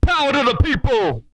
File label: power, vocal, hip-hop; TB HIP-HOP